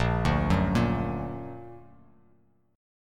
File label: A sharp Augmented; A#+ arpeggiated; JGuitar